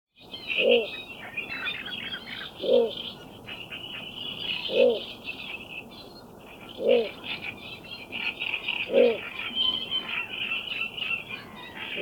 Golosa ptic malaya vyp

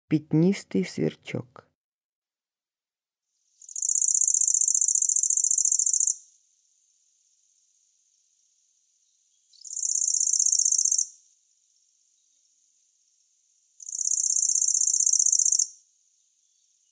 sverchok pyatnistyy golos

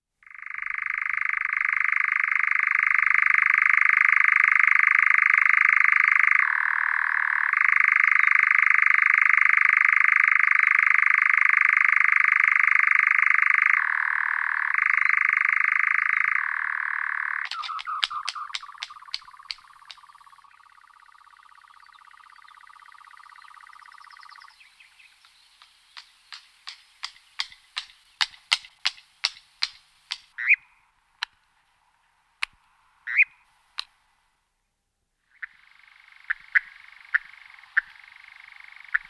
Голоса птиц – Обыкновенный козодой Caprimulgus europaeus

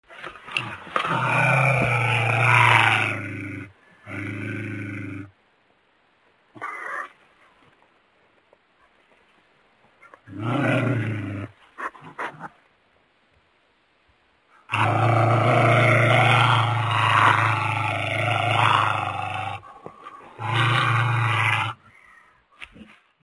Golosa zhivotnyh lesnaya kunica

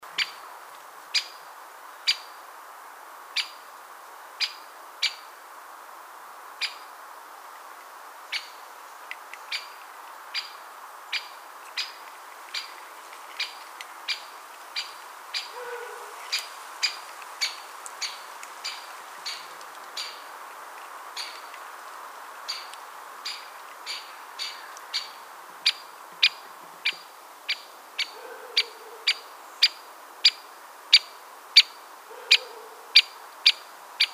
dyatel bolshoy pestryy golos

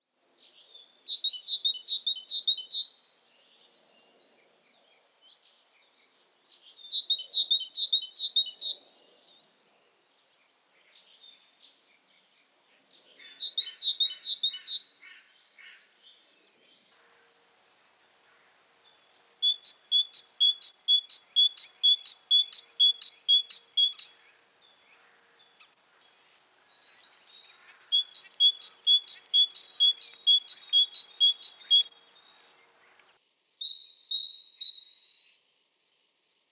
Golosa ptic Bol shaya sinica

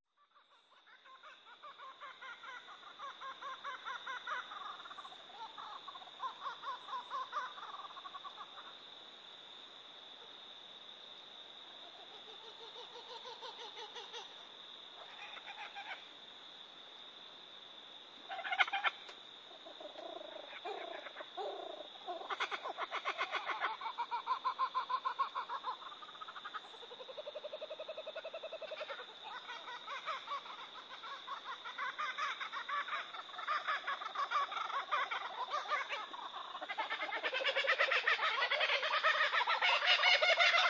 Krik kukabarry Ptich'e penie ochen' pohozhe na smeh

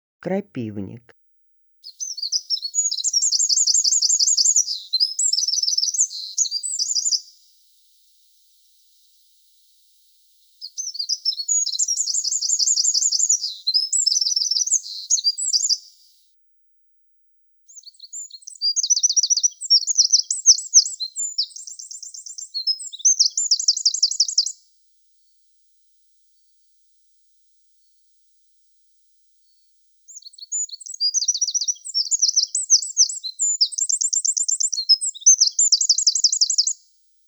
krapivnik golos

